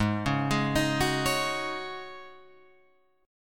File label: Ab 7th Suspended 4th Ab down Guitar Open F, Chord